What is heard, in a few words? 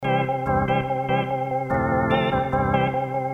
Squirrel Hop Loop